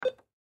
Printer Button Turn Off Beep Computer; Office; Printers; Blastwave

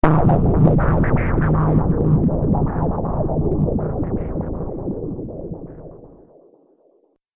Evil Energy Explosion; Battle Sound Effects

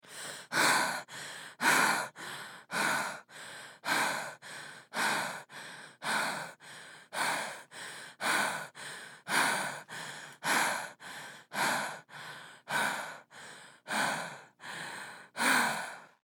Human Female Pant Long

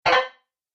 Message Alert, beep

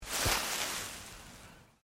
LEAVES MOVE MINOR, Rustling; Material components for constructing more complex sounds; Rustling (Sound Effects:Objects)

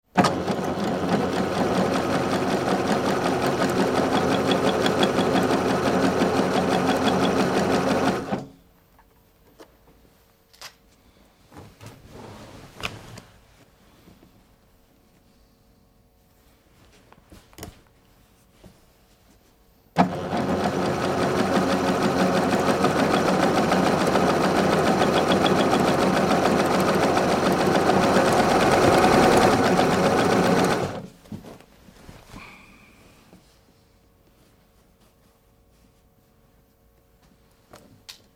Sewing Machine Electric, Various sewing moves; stitching heavy material; Miscellaneous Electronic; Tom Hutchings

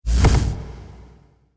Cinematic Hit, Trailer Sound Effects; sound design

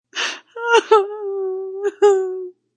Female Short Sob, A female sobs or cries.; Crying; Voice

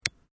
Cigarette lighter ignite

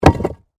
Impact Rock Drop, Heavy, On, Rocks; BlastWave

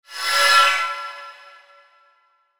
Pixie Dust Airy Tonal Fly By; Magic; Blastwave

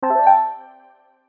Musical Accent, Musical Accent Keys; Keyboards; Blastwave

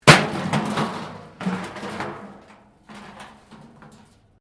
metal rubbish bin smash concrete; Materials

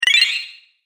Game App Extra Life, Video Game Sound Effects

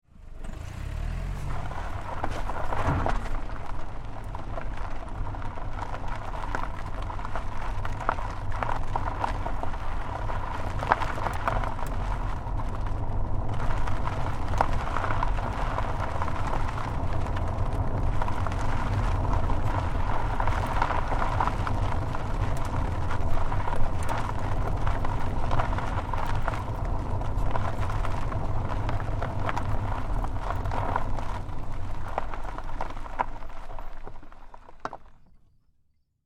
Car Tire Onboard Gravel Dirt Roll Slow Long; Cars; Big Room Sound

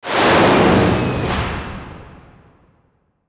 Whoosh Airy Static High Frequency; Blastwave; Whoosh Sound Effects

